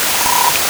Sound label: pond